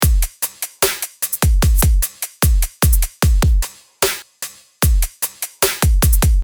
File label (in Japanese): グルーヴ before